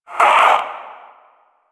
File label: Possessed Vocal Pain; Enemies; Warframe; Priest Quest; Possessed Red Veil